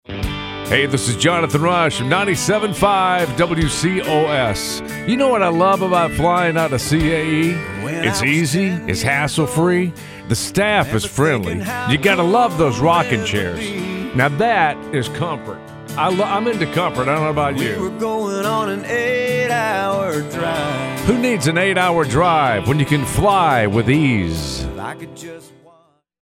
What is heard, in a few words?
Rocking